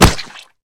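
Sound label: cheerful slap on mud puddle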